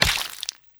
splat (Wet)

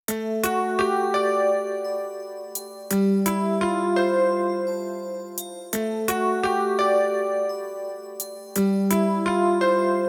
Tension

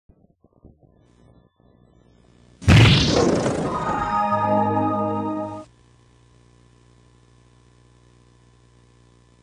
PC-FX Startup

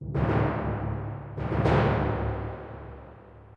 Creep Tymp

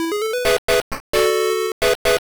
Game Victory; Kamen Rider 555; Pico

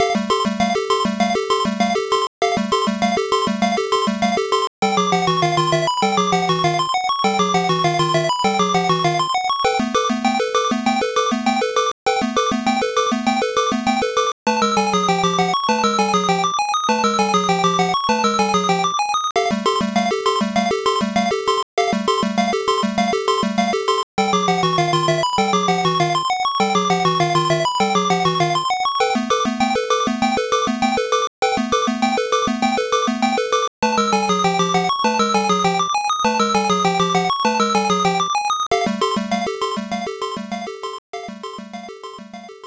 Minigame BGM; Issho ni Hashirou Kikansha Thomas; Pico gamerip 1995

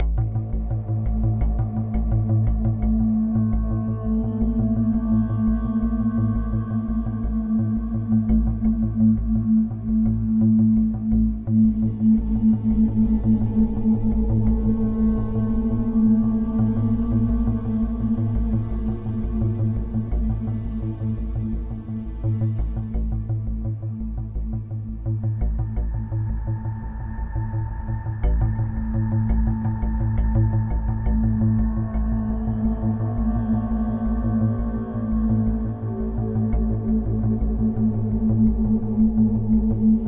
Ambient Tension; Shadowgrounds; windows gamerip 2006

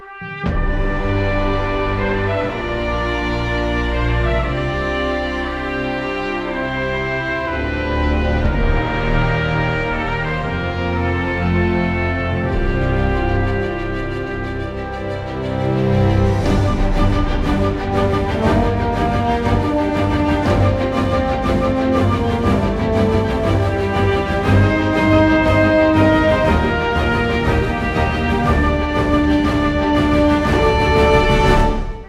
Menu Update Screen Loop

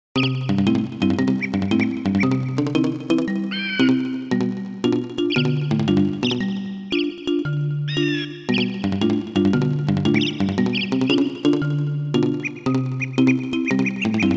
Inside The Treetops - Spring; Banjo-Kazooie; Click Clock Wood

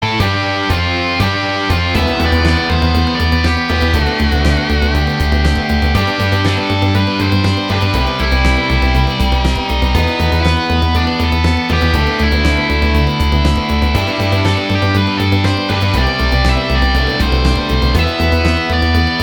Main Menu Theme Song; Happy Wheels

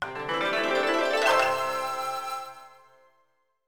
Jingle, Win Jingle; Itsudemo Dokodemo Dekiru Igo AI Igo DS; DS gamerip 2006

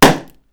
Bang Pop Crack Whoomph

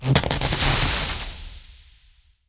Electric

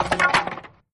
skel die sound; Arc of Templar

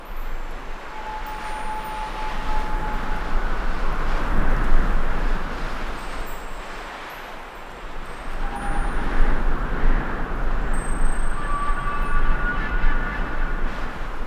music clouds; amb; Rayman Origins; music world

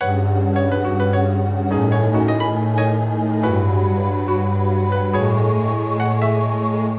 BGM STAGE BATTLE; Phantasy Star Zero

